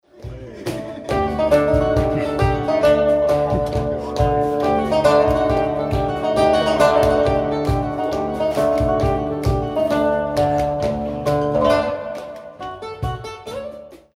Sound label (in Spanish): zambra